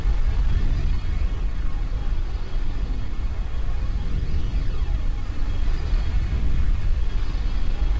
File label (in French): Jauge Loop Long; Puddle